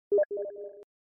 steam

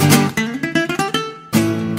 flamenco guitar spanish guitar; long flamenco guitar mix; soap opera music sound effects

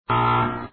incorrect

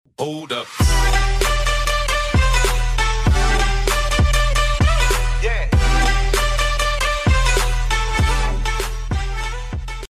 sub music

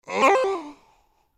moan

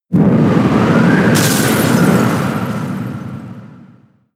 blizzard

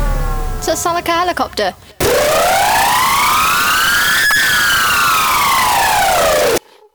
sound like helicopter

